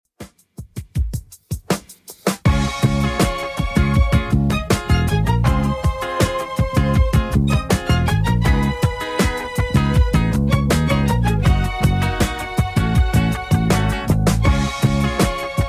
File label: 90s Redrum 80bpm